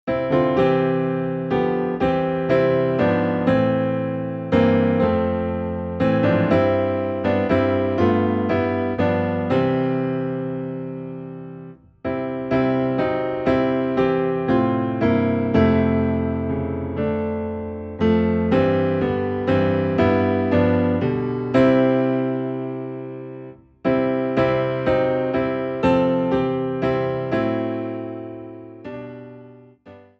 Piano Low in C; Hi F Lo C; Turn Your Eyes Upoin Jesus

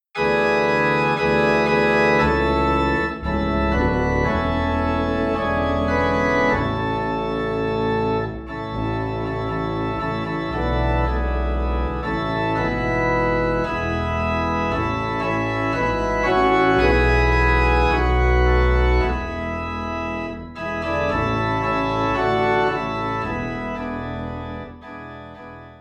Organ Low in A

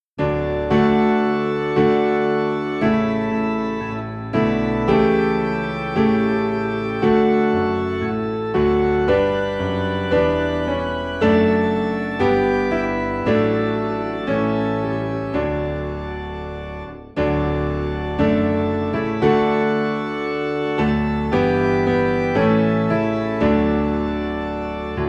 Piano and Organ Low in D

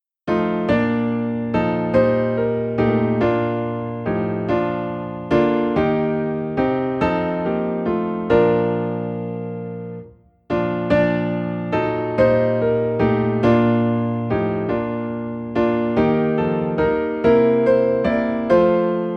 Piano in B Flat

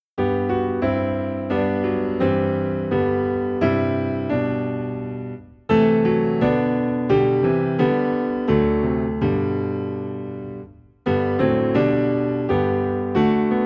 PIano Low in G; hymns accompaniment; christmas; While Shepherds Watched Their Flocks; Christmas 25 Hymns accompaniment

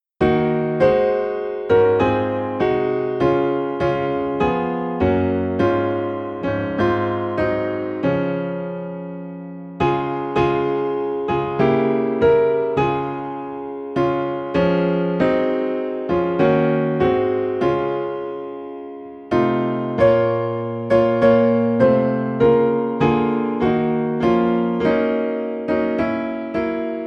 Piano in D Flat; Beneath the Cross of Jesus

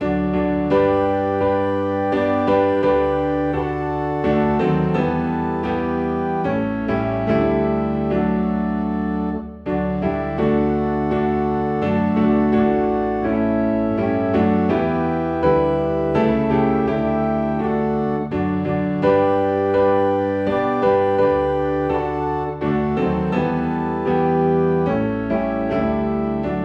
Piano and Organ in D; Children of the heavenly Father

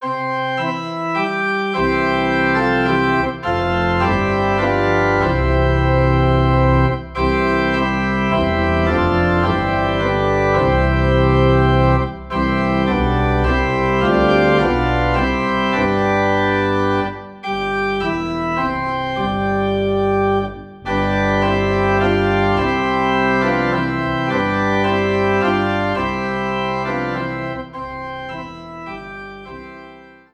Organ Low in C

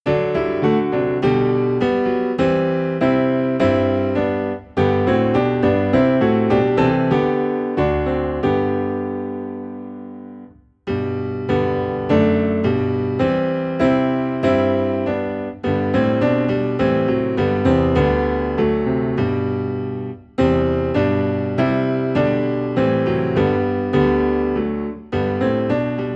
Piano Low in G; Christ the Lord Is Risen Today

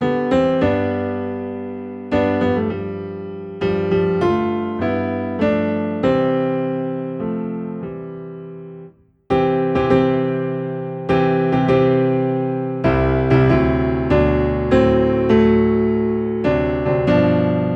Piano Low in C, hymns

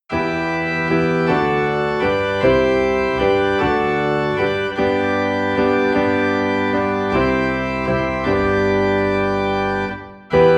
We Three Kings, Piano and Organ in E Minor; christmas

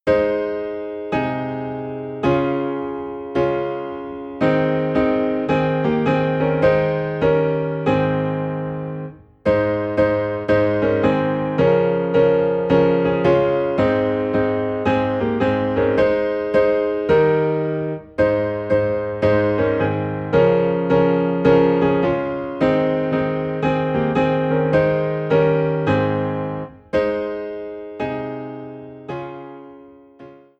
Piano in A Flat; Leaning On the Everlasting Arms

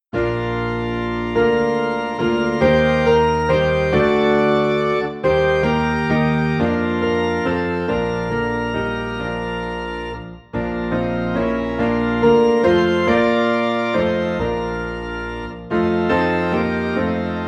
Piano and Organ in B Flat

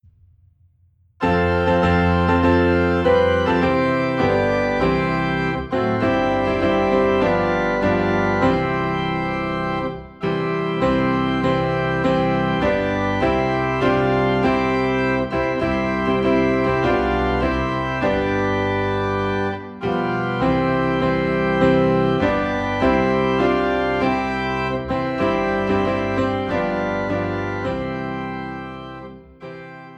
Piano and Organ Low in C; A Shelter in the Time of Storm

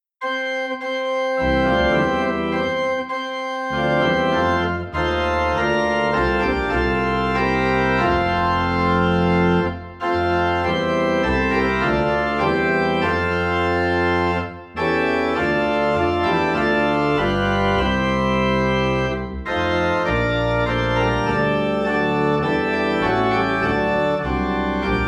Organ in F; Rejoice Ye Pure In Heart

